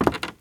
squeaky wood walk, step, bamboo

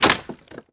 break

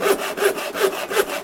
craft saw; ambient

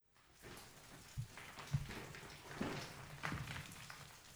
small slide, ambient underground

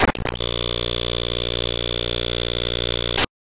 crash; events; sudden scare

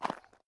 mud walk, step

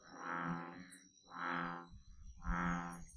frog short; ambient

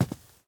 grass walk

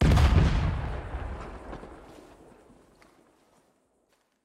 explode

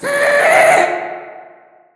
scream

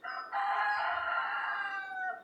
rooster

